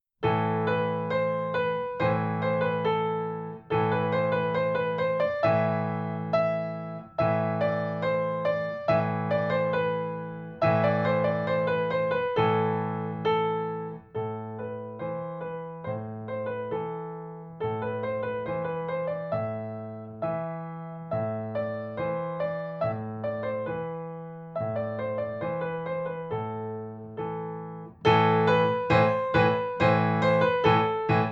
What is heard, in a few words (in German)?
Bordun Variationen